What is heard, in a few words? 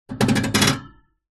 animals mouse cage metal bars finger run across